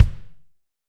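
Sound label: AMB KI, ambient kick, studio; AKAI S6000 CD-ROM - Volume 3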